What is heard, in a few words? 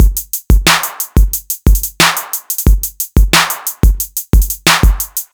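Electro Beat, rap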